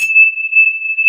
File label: TING WAVE, Stringles